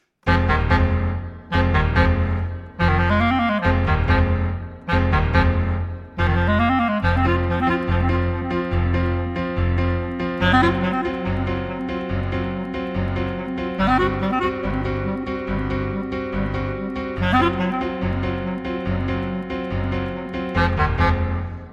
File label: clarinet piano